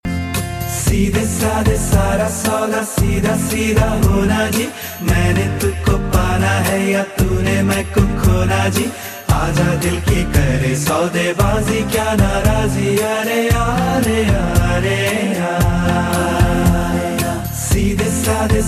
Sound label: Soft Tone; Akrosh